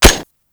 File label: Mech, Weapons; Lato Vandal